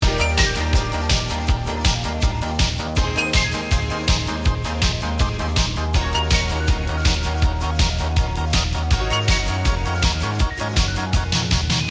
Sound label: bgm